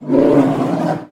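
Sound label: scary indignant roar of a brown bear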